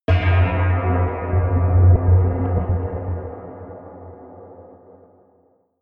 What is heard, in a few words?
melodic metal movement low, drones